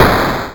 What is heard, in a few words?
boss explosion; ahoge20